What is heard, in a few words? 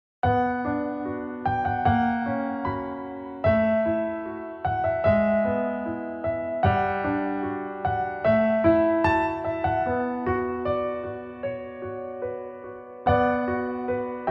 Last song Piano Arr.; Shin Hayarigami 1 2 Original Soundtrack